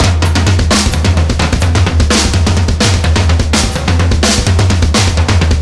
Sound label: drums, horde; music; szf; zombat